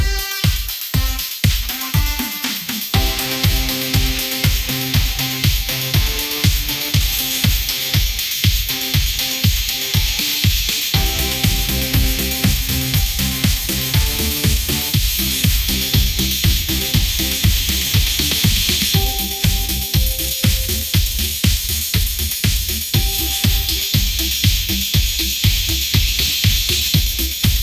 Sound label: upbeat pop melody, ringtones